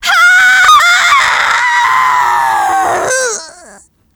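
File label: scout pain critical death, fem scout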